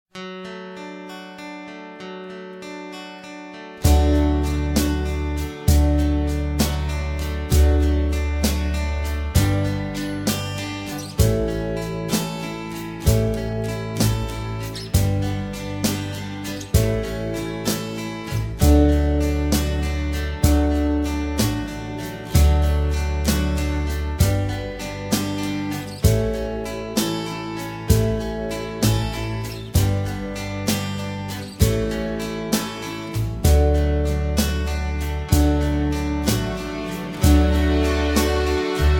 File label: D No Backing Vocals, backing tracks